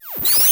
door Close; Kenney's Sound Pack; Sci-Fi Sounds

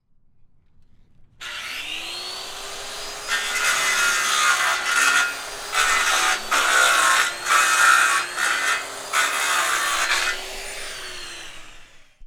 Miter Chop Saw; Power Tools; Wav Junction Sound Effects

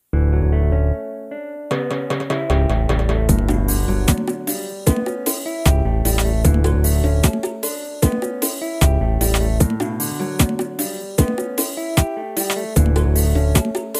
Ragga Smooth, Reggae

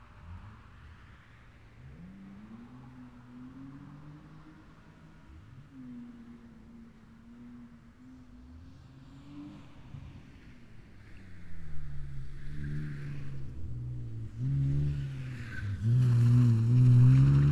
track corner BMW E36; Race Track Corner; stereo; SoundHolder

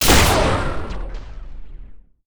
Heavy Gun Experimental, Futuristic Guns; David Dumais Audio; Futuristic Guns Sound FX Pack 3

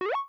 phaser up; Kenney's Sound Pack; Digital Audio

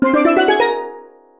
jingles STEEL; Kenney's Sound Pack; Music Jingles; Steeldrum

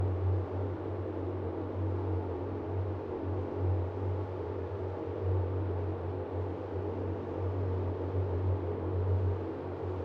Space Ambiences; Drones & Atmospheres; Fox Audio Post-Production

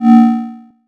force Field; Kenney's Sound Pack; Sci-Fi Sounds

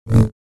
Saber; FXHome

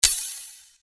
Sword Sound; FXHome; Fighting Sounds

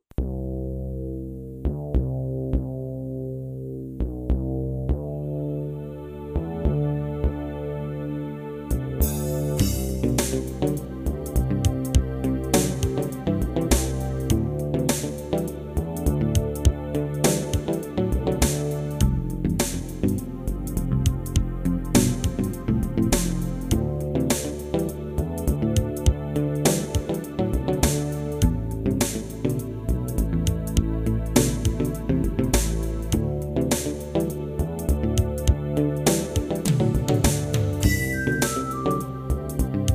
Ballad

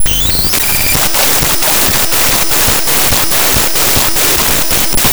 Rhythm

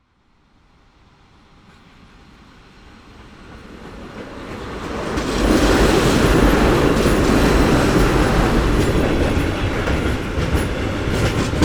Fast Freightliner Wagon Clanks; The Sound Pack Tree; Trains

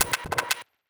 Broken Robot Blip Chaotic Pitch Receipt Print; Sound Spark LLC

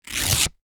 paper rip; Books and Paper

